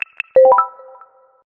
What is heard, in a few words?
Nfc Transaction Complete; Lumia Ringtones